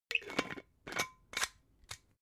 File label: glass bottle massive close slow